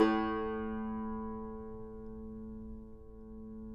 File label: CUMBUS A, Guitars; Spectrasonics; Hans Zimmer Guitars VOL-2